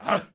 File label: Knight Fort Vox; Civ4 warlords